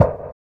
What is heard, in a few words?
PERC; Spectrasonics; Metamorphosis; 3RD MAN